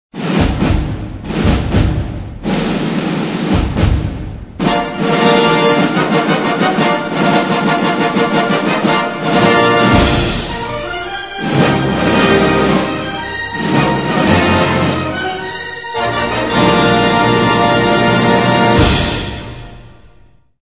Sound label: fox fanfare